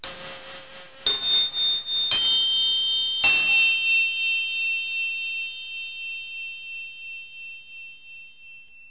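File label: slow scale, bells; Multimedia Cube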